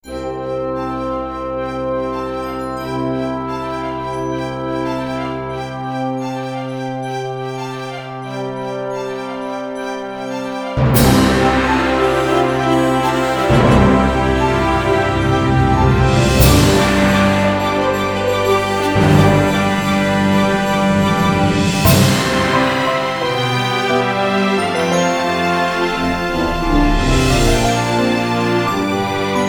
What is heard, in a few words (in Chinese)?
颁奖音乐